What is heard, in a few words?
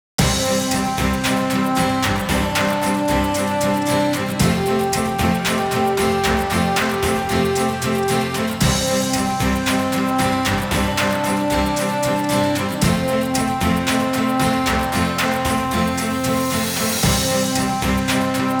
Achievement jingle; Devil Survivor Overclock